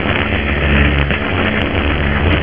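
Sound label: laser lightning; quake2; coop; slaughter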